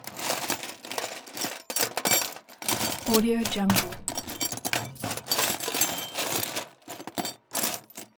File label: junkmetal